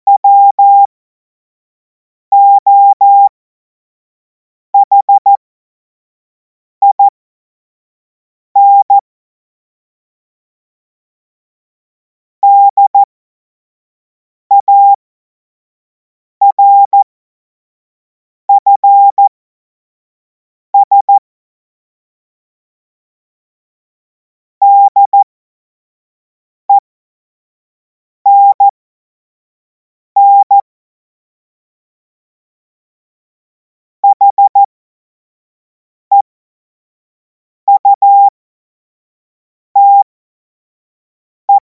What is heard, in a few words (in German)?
Witz, Morsekurs, cw morsen; Tempo 25 F70; hamradio; cw witze